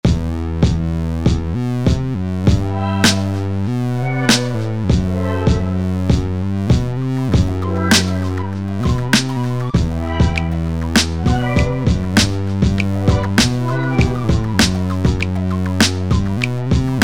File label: synth hop; weeklybeats 2026